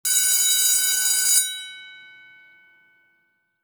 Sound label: School Bell; Mean Girls; Rehearsal Tracks